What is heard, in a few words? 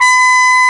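CORNET C5; AKAI S-Series CD-ROM Sound Library VOL-2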